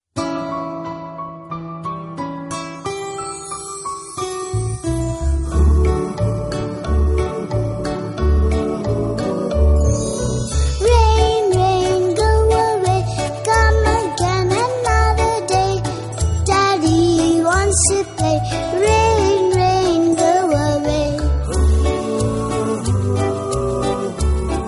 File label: Rain rain go away